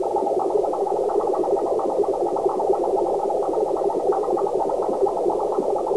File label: ambience